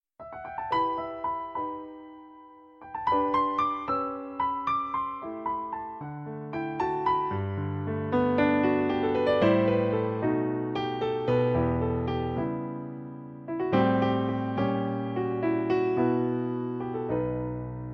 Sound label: Piano